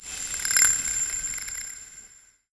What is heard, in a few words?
Pass By